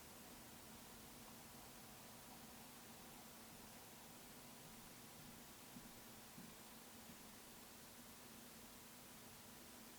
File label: footsteps evp